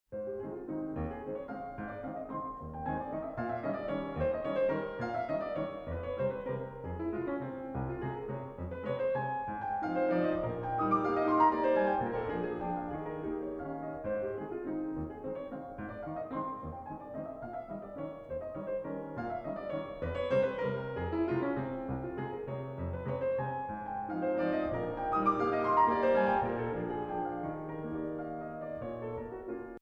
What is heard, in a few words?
Valse In A Flat Major